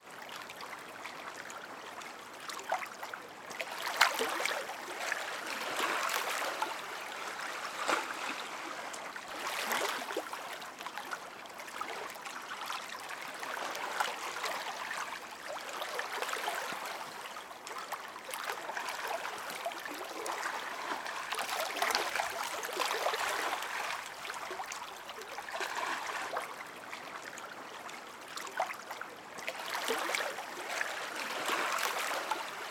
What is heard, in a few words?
loch ness ambience small waves lap distant traffic scotland